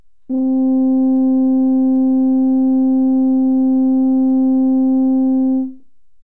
Tuba C4

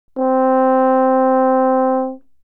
French Horn C4

